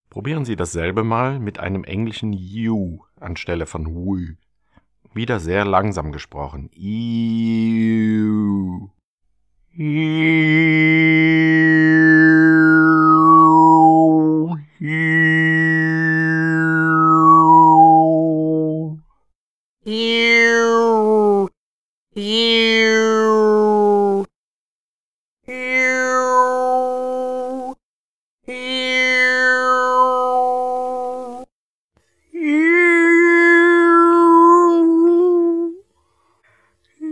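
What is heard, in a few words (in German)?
L Obertöne mit YOU; Buch Oberton Singen CD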